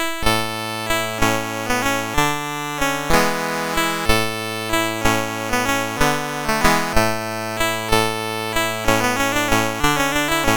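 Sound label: MOS 8580 SID chip music, games; CSG8580R5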